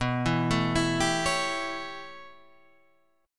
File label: A 7th B, chord; A7/B; arpeggiated; JGuitar